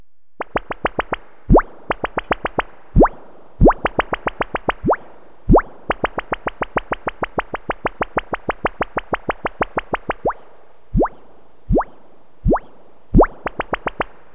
scifi

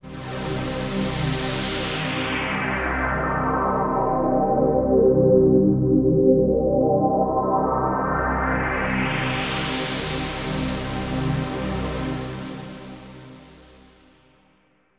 SYNTH PAD AMBIENT 160 C, Ambient; eJay; Sphere

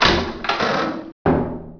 DOOR; Hidden & Dangerous; h&d demo

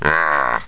GIANT

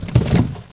Scuffle; Princess and the Pea; SoftKey

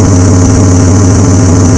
ENGINE; Carmageddon Demo

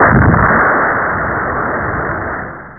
Big explode; Bunny Killer III

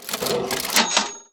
cash register sound; LastCall

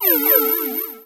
shortcut deactivate ability; Earth and Beyond

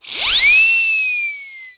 COPTER; Pop the Wheezil

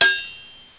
ric, weapons; Hexen2

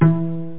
pluck, net instrument; Internet 1996 World Exposition; BrainOpera; net-music

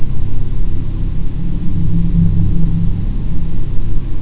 wind; CHASM

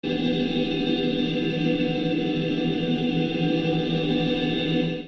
Fla Tube

